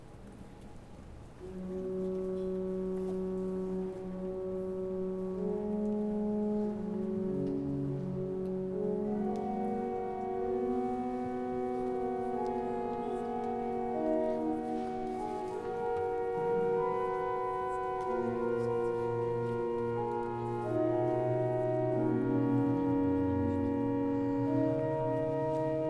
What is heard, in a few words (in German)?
Orgelvorspiel, St. Thomas; Straßburg